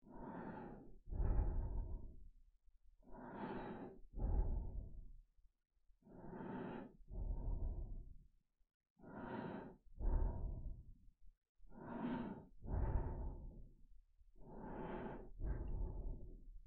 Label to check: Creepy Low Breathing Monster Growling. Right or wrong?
Right